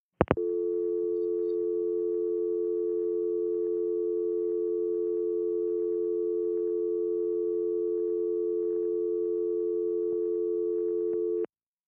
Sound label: Tel Dial Tone Click, futz